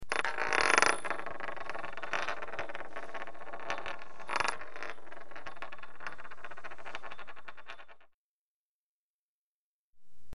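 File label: Coin Spinning On Floor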